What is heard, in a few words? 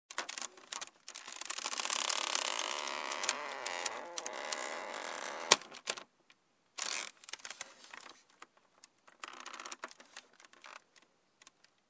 Crackling Creak